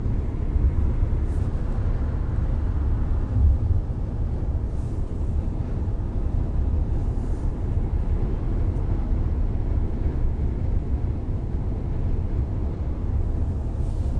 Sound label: blowing wind